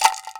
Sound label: RATTLE, INDIAN PERC